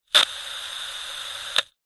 radio receiver or other receiver click hiss crackle no signal short